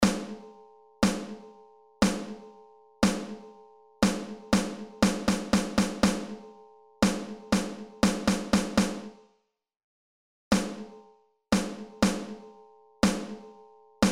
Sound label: Rhythms Snare Drum